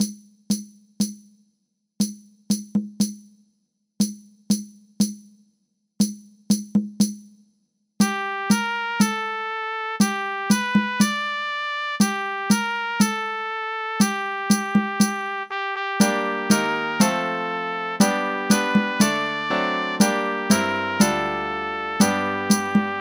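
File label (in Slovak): Stará pieseň pre trúbku a klavír - trúbka + klavír, malý bubon, tamburína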